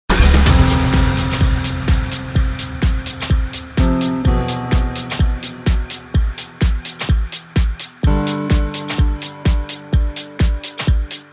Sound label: dub strumental mix